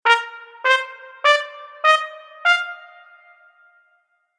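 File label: Horn Staccato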